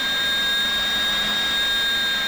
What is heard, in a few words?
Projectile Flight Loop; Weapons